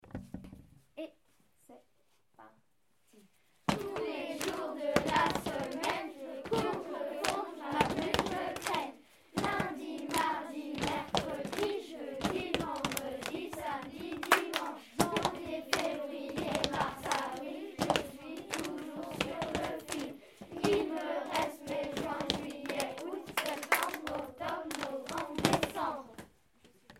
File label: Percus corpos